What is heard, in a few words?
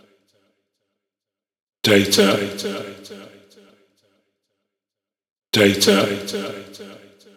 Vocal Loop 130, Techno; Loops; Vocals; GhostHack; Ghosthack - Free Techno Sounds 2024